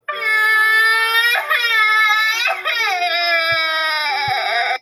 crying; finn

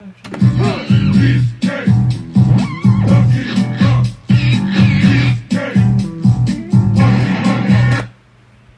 funk

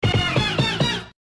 combo sound

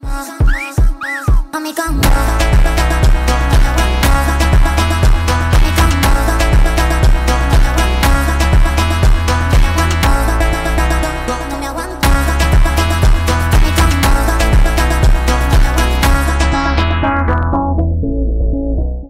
phonk

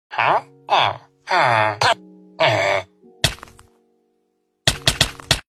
fighting

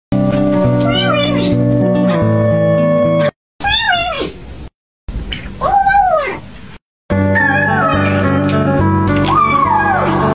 wi wi wi cat

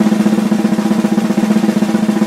drumroll

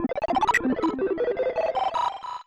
enemy shield

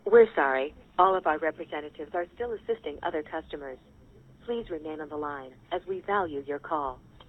classic hold sound

